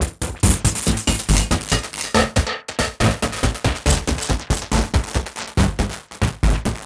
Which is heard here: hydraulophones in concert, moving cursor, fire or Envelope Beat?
Envelope Beat